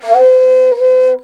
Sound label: INDIA ALTO